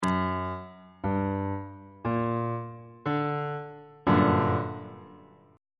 f double sharp minor major 7th 3rd inversion on bass clef